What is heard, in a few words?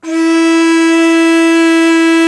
World Winds, Old World Instruments